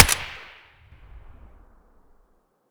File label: saiga silencer mono distant, weapons